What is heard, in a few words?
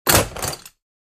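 Metal Latch Fast Impact; kicking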